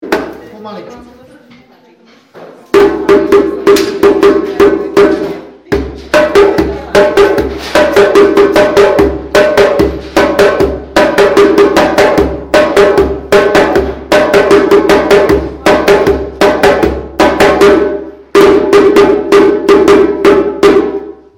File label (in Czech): Bubny, djembe; lekce